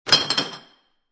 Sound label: Glass Bottle Recycling Bin Toss, Impact, Glass Bottle Thrown Into Recycling Bin And Hitting Other Glass Bottles In Bin